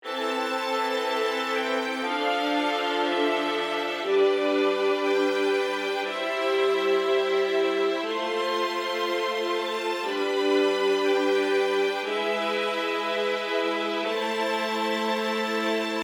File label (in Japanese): ストリングスオープンボイシング＋メロディ